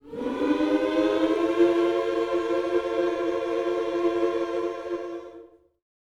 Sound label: MINOR, Gospel; Vocal Planet Vol1 Gospel